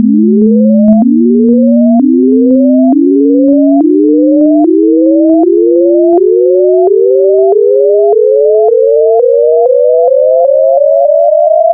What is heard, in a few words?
Add Partials 2N HF, wavetables Additive; Architecture 32